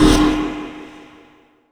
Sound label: Out Of Bounds